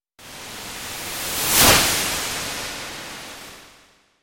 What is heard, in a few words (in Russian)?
zvuki s effektom peremeshcheniia videokamery